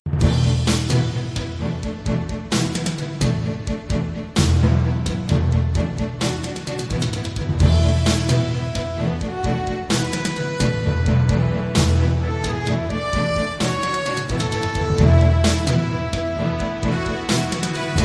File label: headline news now